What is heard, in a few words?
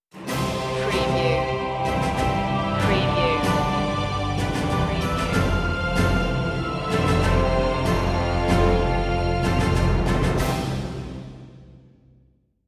american frontier stinger